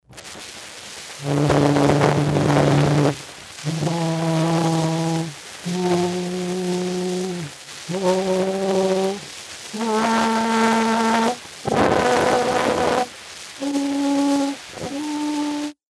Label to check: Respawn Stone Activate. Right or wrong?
Wrong